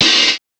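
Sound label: HI-HAT OPEN; CYMBALS; CMI Sound Library Rev 1.3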